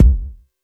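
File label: Club bass drum, Jomox Airbase99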